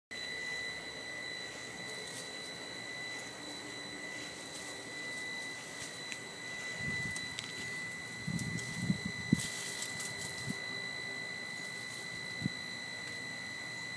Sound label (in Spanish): Mi gato jugando con mis conejos